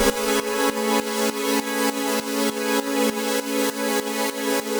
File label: Pad dbx 100 A, sidechained